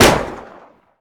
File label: fn2000 shot, weapons